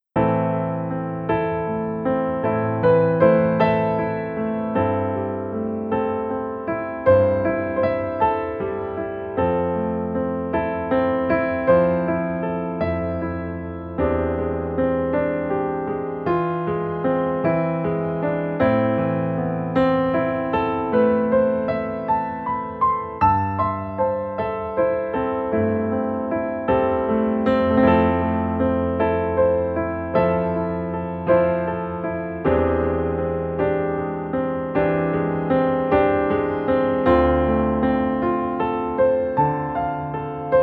Cocktail Piano Blue Mood 104

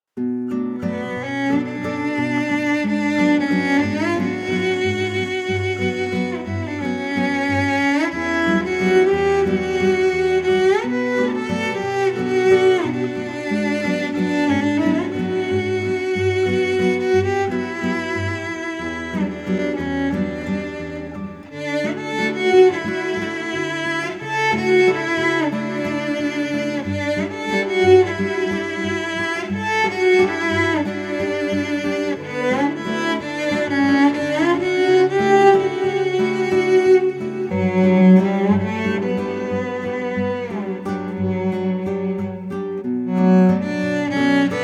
Street Cello Guitar Pensive 90

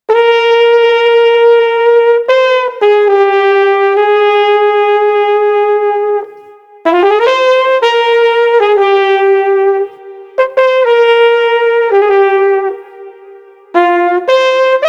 Street Flugelhorn Tranquil 110

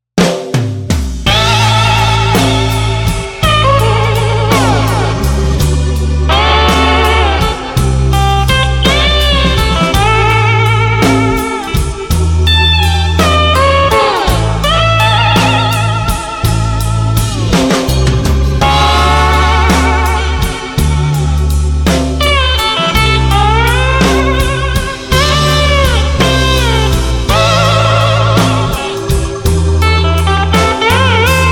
Salty Dog Blues no Harp 166

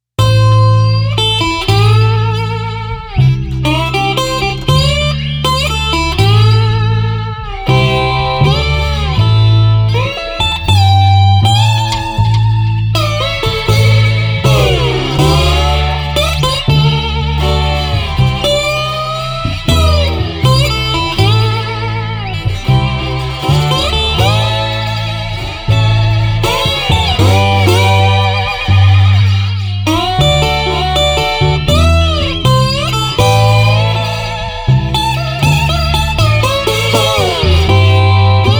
Bottleneck Spirits no Drums 120

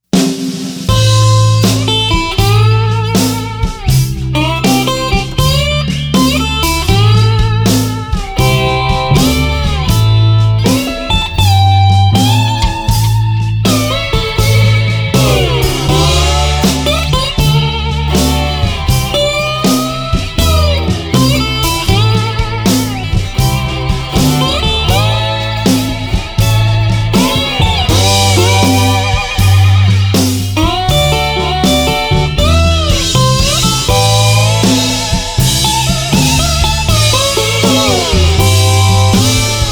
Bottleneck Spirits no Harp 120

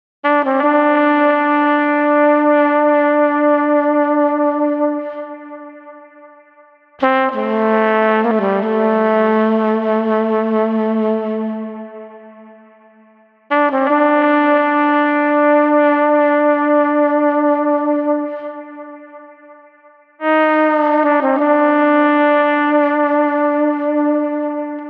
Street Trumpet Heavyhearted 75

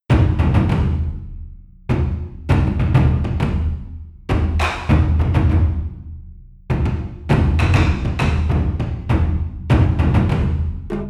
Hawaiian Percussion Taiko Stomp 100

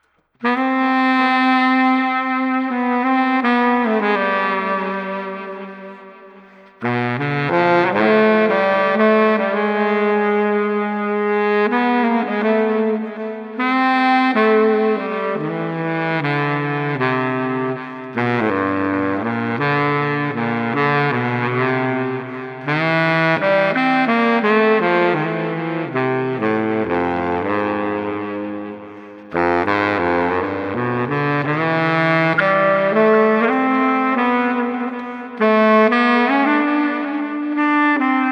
Street Baritone Sax Emotional 82

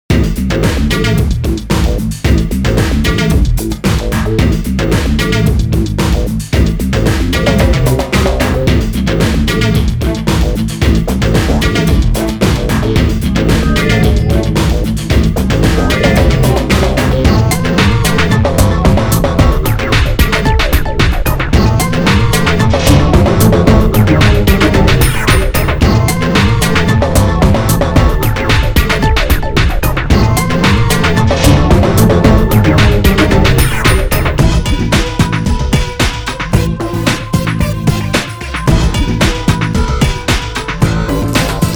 Industrial 112

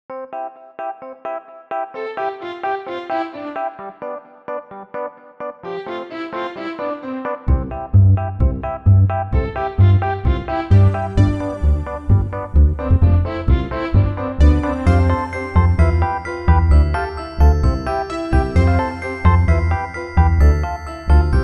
Upbeat High Jinks No Drums 130

